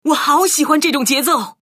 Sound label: rocktopus sample jazz